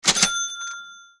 tieng dong xu mua buy

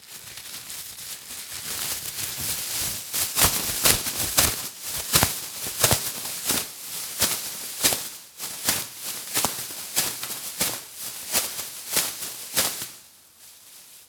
Am thanh tui Ni long tui Nhua lac

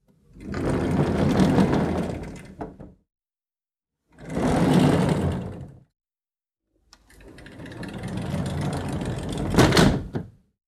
tieng keo canh cua truot

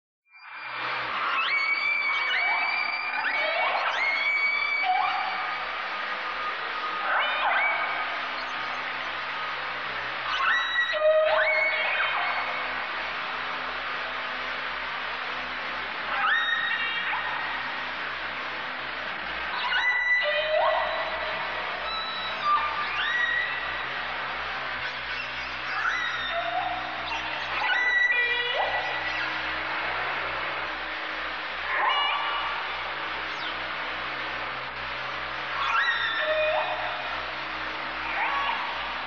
am thanh cua ca voi sat thu

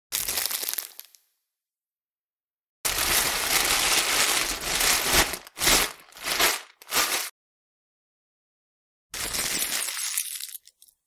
tieng nhau vo tui ni long sot soat

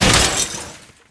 tieng pha vo buc tuong kinh thuy tinh

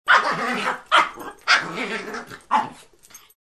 tieng gam gu hung han cua mot con cho nho

